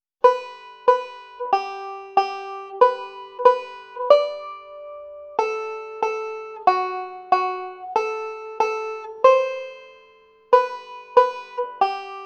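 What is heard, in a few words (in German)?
oktavierte Melodie für skip to my lou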